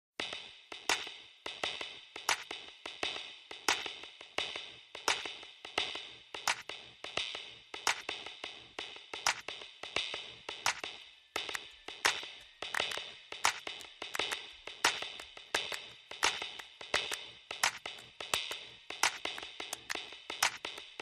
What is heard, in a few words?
Drums and Bass